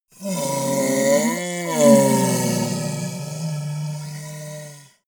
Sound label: monster dark growl hoarse; kreaturen